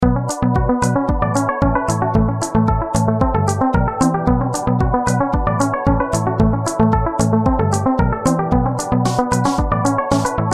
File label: stab instrumental